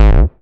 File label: Offbeat Bass G#; Vengeance Samples